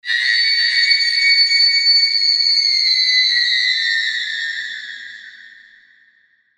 HALLOWEEN FEMALE SCREAM DISTANT

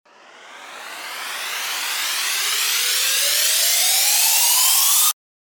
RISER

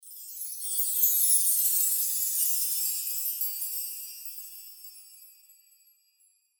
SFX CHIME D

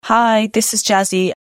JAZZY

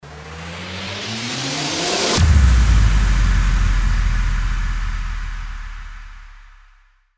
RISING IMPACT

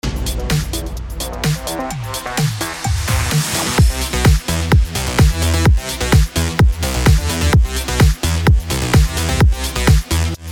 BED ELECTRO HOUSE EMIN 128BPM

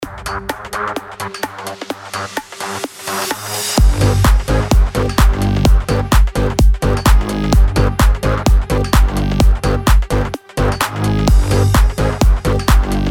BED BASS HOUSE GMIN 128BPM

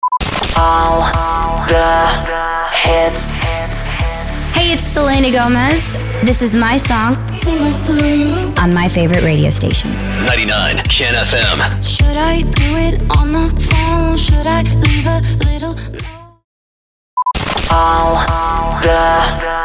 POWER INTRO 105BPM; SWEEPER

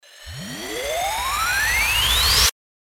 RISER

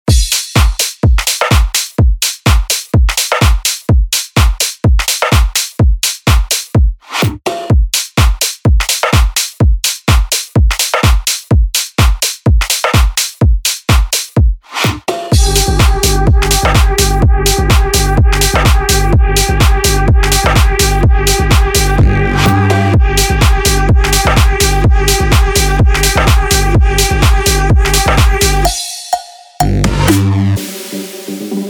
TV NOISE SOUND INST 126BPM Gm

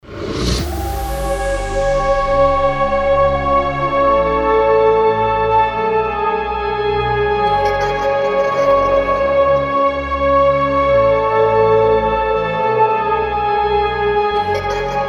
DRONE GRAVEYARD CHILLS HALLOWEEN 140BPM F#

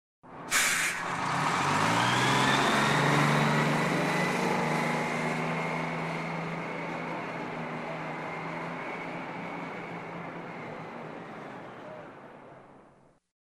BUS

